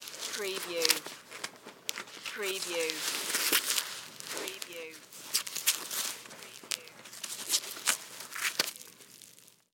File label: Bulletproof Vest On; sound effects bible